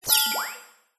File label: science fiction computer activate tone